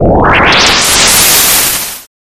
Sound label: warp